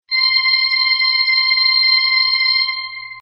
synthesizer

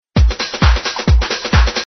synthesizer

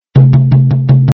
drums

